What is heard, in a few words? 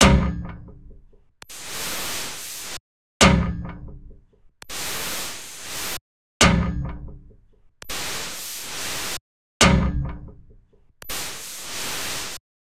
percussive clock metal noise tension loop 75 bpm; sonniss gdc